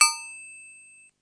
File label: FM Cymbal, harsh; Harsh FM Cymbals